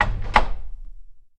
Apartment Door Clos; latches & bolts

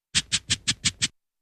Dog Scratching

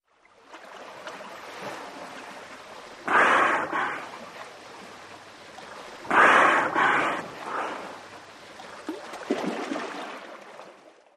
Dolphins, Swimming with Blow Hole Noises.

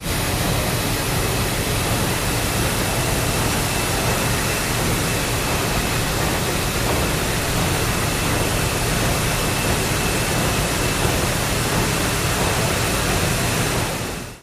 engine idle, wwii liberty

